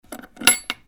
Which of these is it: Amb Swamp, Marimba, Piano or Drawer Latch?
Drawer Latch